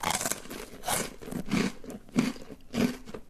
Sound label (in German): vital isst; minecraft